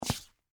Combat Boots Scrape, Footsteps, Combat, Boots, Scrape, Hard, Wood, Surface